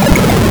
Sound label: CANNONFIRE; Keen 2